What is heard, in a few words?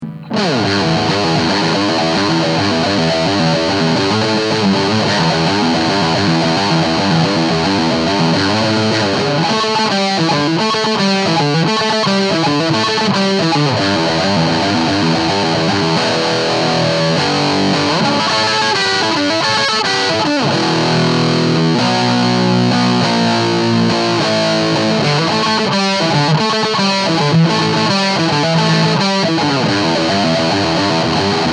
lead; lampes